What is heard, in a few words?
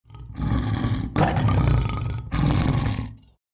Wild lion growl